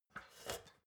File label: step ladder metal scrape single